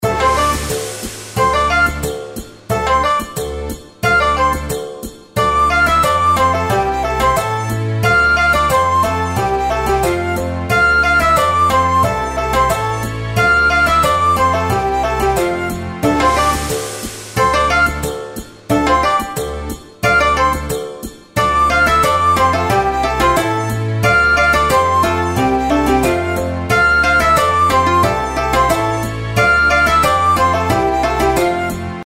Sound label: reelspin fg; Legend Of The White Snake